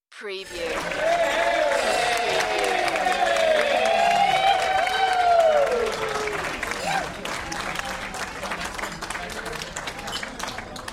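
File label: Banquet Chanting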